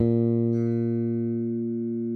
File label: SPEC SUSTAIN, Bass